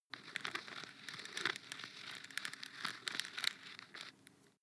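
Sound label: floss pull out slow, bathroom; haus-garten; badezimmer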